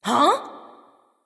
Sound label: confused; smite; terra